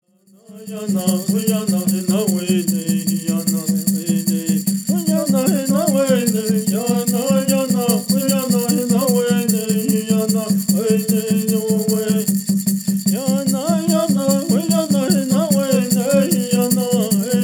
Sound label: Vocal Track